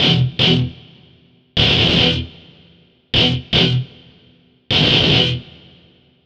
Metal Guitar, Guitar Synths; Dr. Fink's Funk Factory

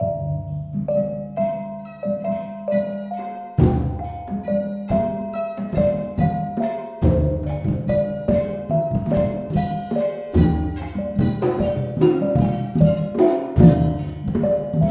MALAY, Heart of Asia; Spectrasonics - Heart of Asia CD2

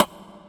Rimshot; Equipped Music; Loungin House

